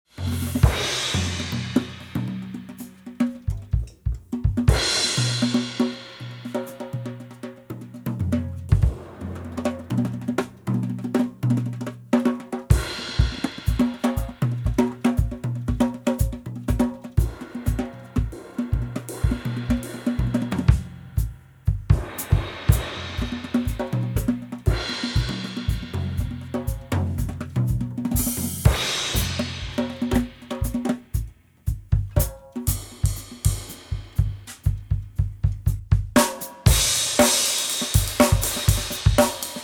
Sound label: Crescent Haptic Hand Crashes